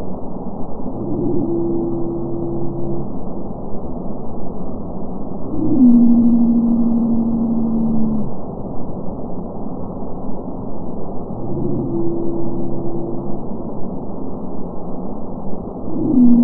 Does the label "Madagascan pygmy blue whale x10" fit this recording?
Yes